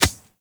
Beach Ball Bounce Dirt; Warframe; Water Fight; Beach Ball Floof

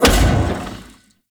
Space Fighter Dredger Rocket Explosion; Grineer

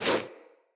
Wall Jump Foley; Tenno; Footsteps; Wall Run

